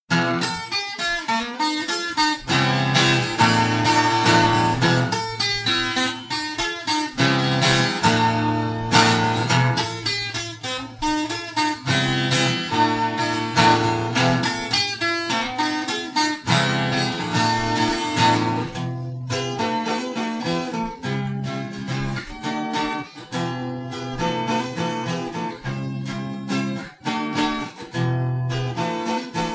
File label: Acoustic Guitar Riff